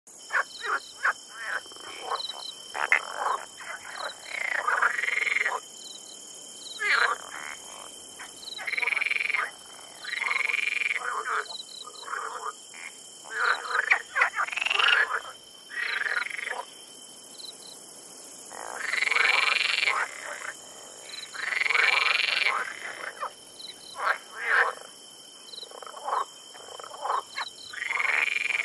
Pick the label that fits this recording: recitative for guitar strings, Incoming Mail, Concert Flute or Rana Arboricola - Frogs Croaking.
Rana Arboricola - Frogs Croaking